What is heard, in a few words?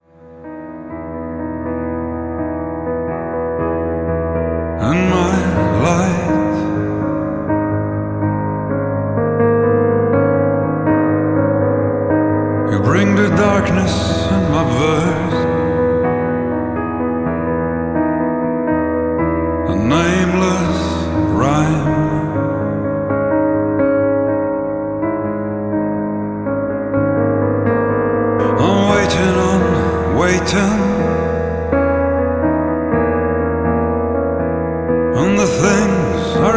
Darkness Acoustic Piano Version